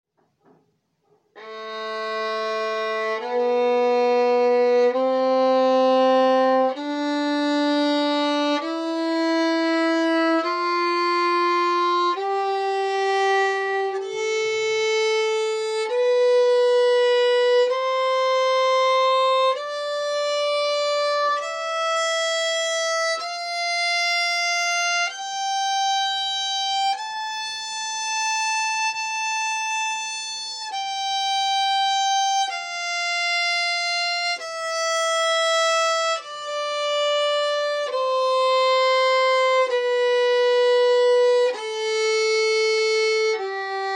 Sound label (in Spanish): Escala en la menor